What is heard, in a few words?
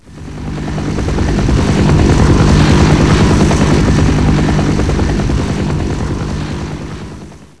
rotor seq, ambience